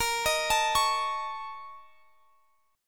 Bb Minor Major 7th Double Flat 5th, Guitar, Standard; x,x,20,20,22,21; arpeggiated; chord shape